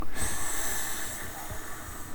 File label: quiet click